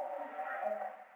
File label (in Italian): Vocalizzo